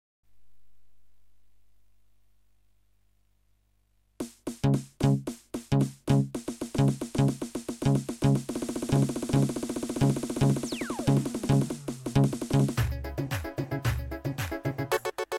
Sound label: suri rhythm